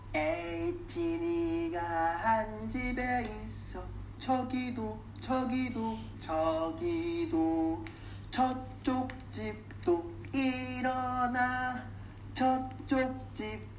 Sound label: Children Song